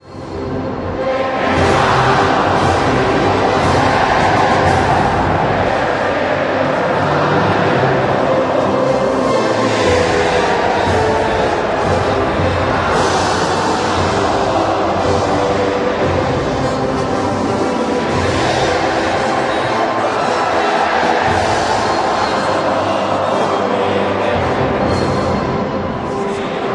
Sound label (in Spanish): himno nacional mexicano